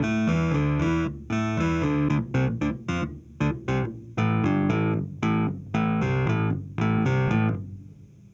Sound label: Cruisin' Disco Clavinet, 70s Analog Funk; Sonic Foundry (Sony Creative Software); What it Is!